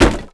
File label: wood fence, Impact